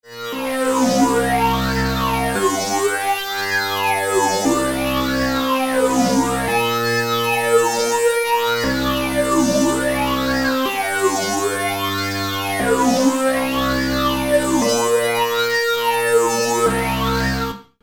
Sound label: Phaser Plate SID on